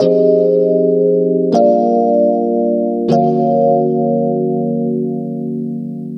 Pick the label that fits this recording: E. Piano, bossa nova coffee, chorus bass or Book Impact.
E. Piano